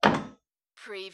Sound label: Wooden Door Small Impact